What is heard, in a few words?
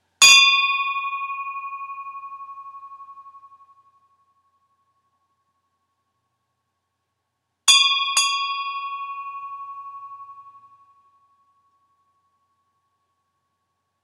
First Recording of Bell